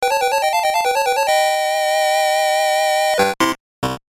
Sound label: Jingle; Digimon Tamers Battle Spirit; WonderSwan Color